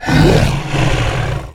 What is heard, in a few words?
Lizard Attack